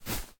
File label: Footstep Beanbag